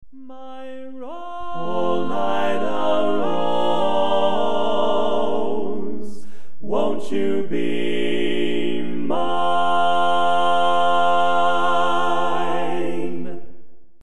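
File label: Baritone, barbershop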